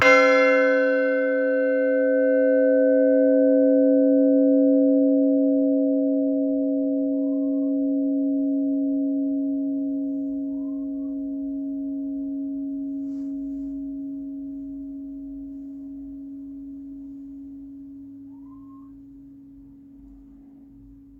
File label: five, bells; funtington